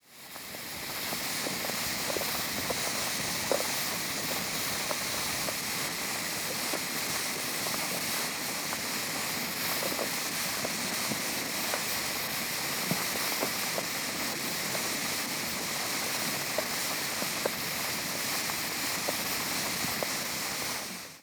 Steam release hot with medium pressure and light muddy bubbles, Geyser